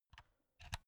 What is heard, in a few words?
insert usb laptop soft, elektronik; objekte